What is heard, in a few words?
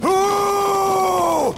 whooooo